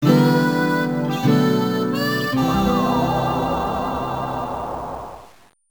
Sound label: Game Over; zed blade; arcade; Dusk